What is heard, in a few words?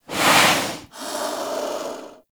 Snow Critter Vocal Breathe; Hunting Critters